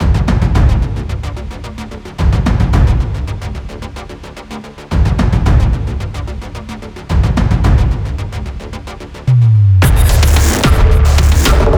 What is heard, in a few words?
Epic Cinematic Beat; Lost Ruins